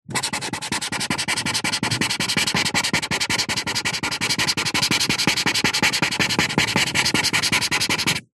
marker pen highlighter luminous colour in fast on paper